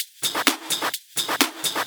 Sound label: House loops 128BPM; Vengeance Samples